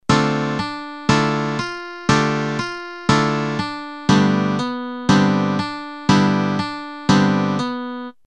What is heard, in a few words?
solo